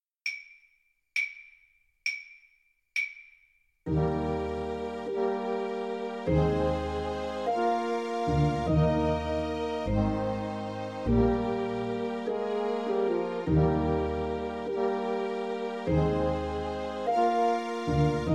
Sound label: skye boat, Trumpet